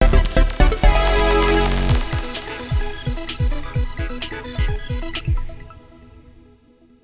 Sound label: Completed Puzzle; Club Nintendo Picross